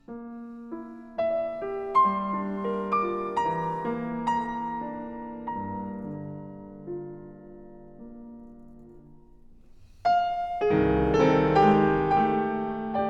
Variations On A Theme By Chopin-Variation 6. Recitativo; Mompou; Trifonov; Chopin - Evocations